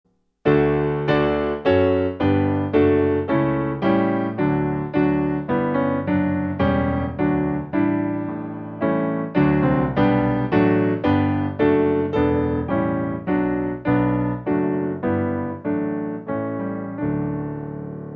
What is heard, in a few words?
St Peter Piano Tune